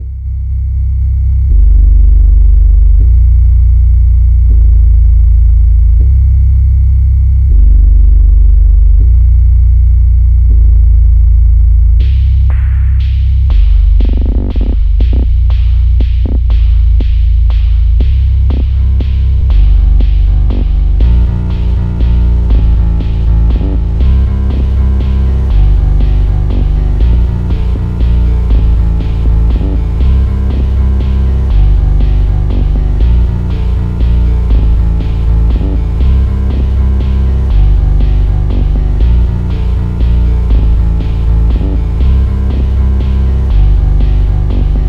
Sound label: Bass draw